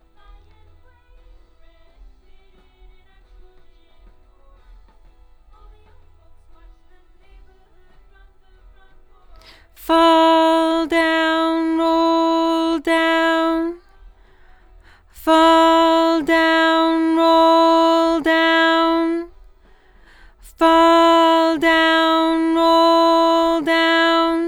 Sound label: LdVOX Double